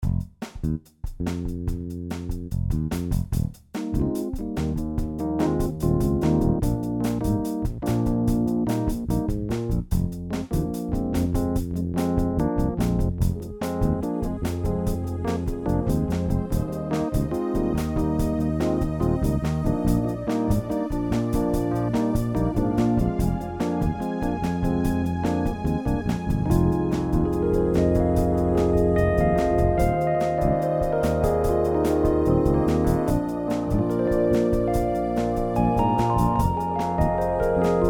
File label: rhodes synth